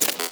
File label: Screen Glitch; Warframe; Caliber Chicks Minigame